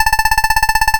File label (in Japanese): 会話; nes retro talk sound effects